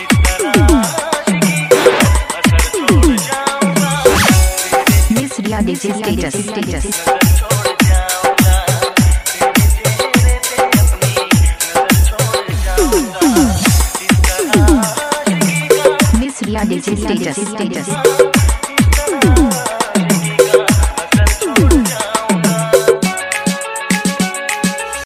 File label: love song ringtone, bollywood ringtones